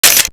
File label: camera shutter